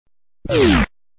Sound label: Door close